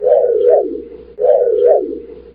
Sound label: Lock Chime